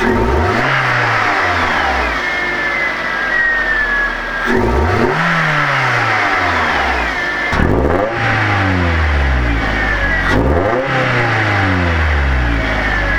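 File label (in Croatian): turiranje, Toyota MR2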